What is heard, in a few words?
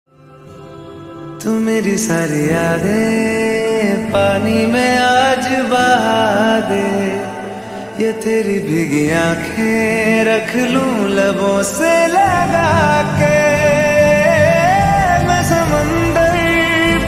slowed reverb ringtone